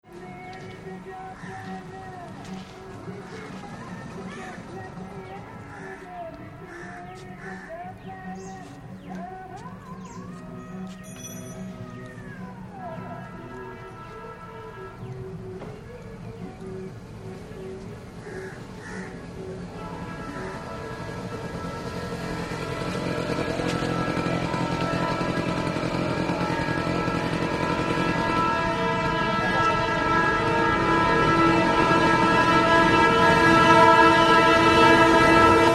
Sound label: Rural railroad crossing, India; Rural railway crossing